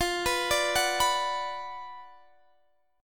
F sharp Major 7th Suspended 4th Sharp 5th, F, chord